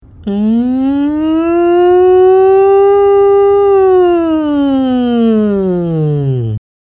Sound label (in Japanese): 仮声帯のハミング